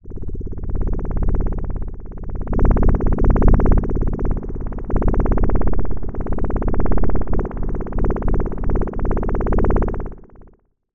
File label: SFX, Sylenth1; SFX - Floating Device; Routed Sound; Sylenth1 Expansion - Quantum Enforcer Vol.1